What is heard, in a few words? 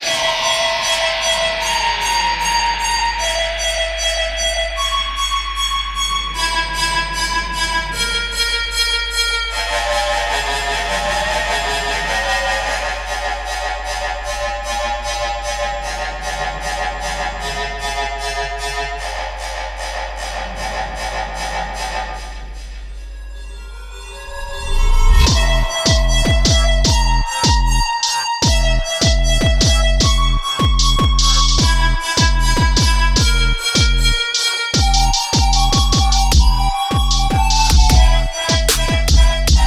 Remix; RBY; Bug Trap